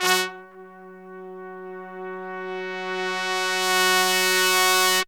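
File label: brass sforzando; Zero-G; Phantom Horns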